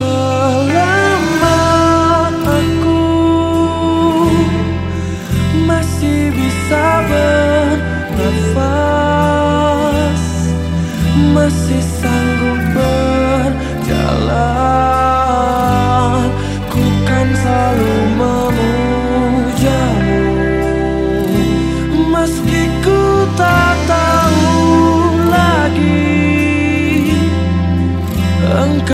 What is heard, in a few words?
indonesian ringtone